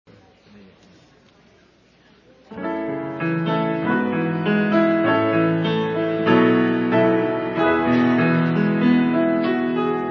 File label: choir